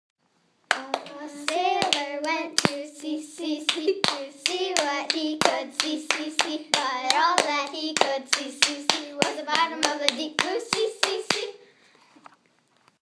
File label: a sailor went to sea